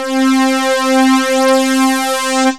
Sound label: POLY STRINGS, SYNTH FUNK; Zero-G; Funky Elements